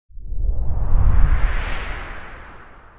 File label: woosh low long